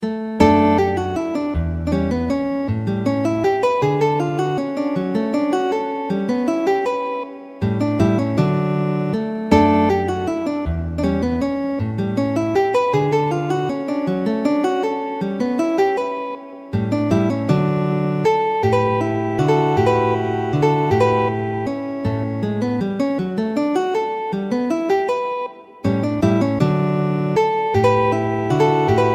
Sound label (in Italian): valses, guitar